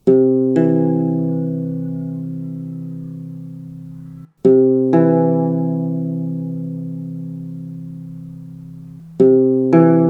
Major third with Just intonation 12TET Pythagorean; Terza maggiore pura temperata 12TET Pitagorica